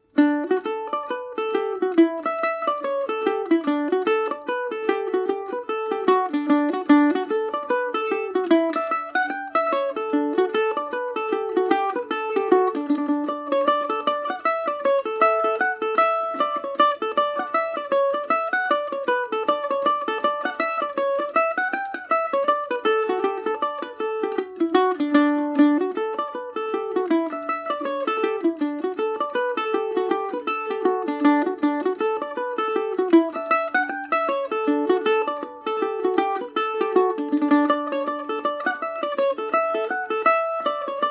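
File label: D major, Irish mandolin; lady forbes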